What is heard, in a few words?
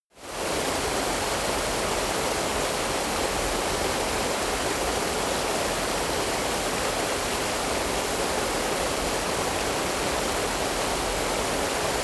river stream noise